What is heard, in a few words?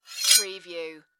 Axe Blade Scrape; sound effects bible